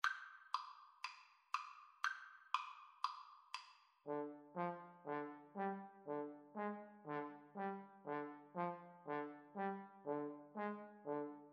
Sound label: Trumpet Trombone Duet